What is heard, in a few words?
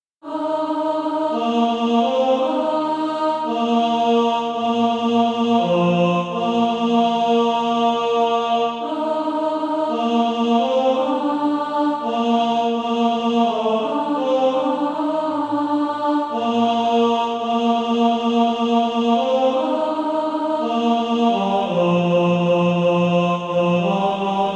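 tenor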